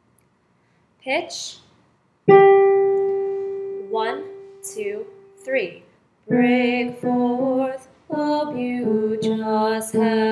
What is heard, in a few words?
TENOR; Break Forth